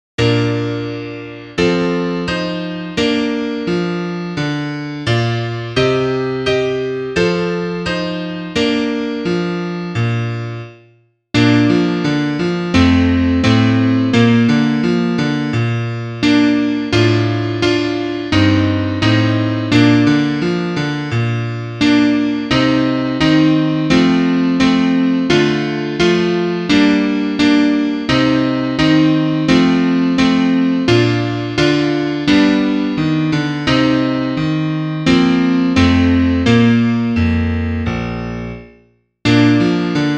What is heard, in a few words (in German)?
Klavier; Liederbuch; schlaf kindlein